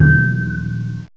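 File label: SUBMARINE; MACHINES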